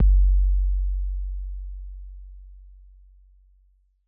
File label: 808 bass raw; Slate 808 Deep Dive; SD Raw 808s